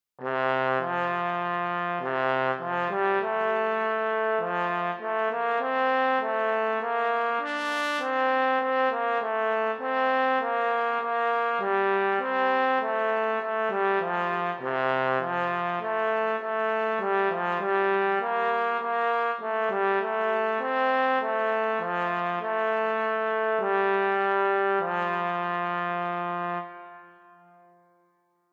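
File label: solo trombone; Sankt Martin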